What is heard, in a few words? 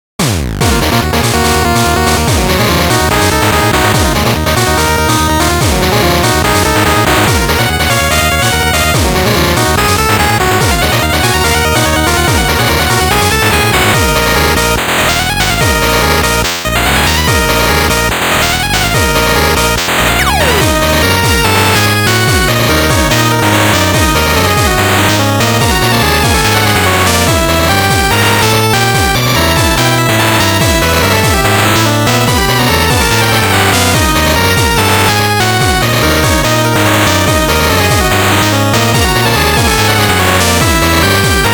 GB Noise mix